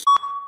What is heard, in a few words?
BEEP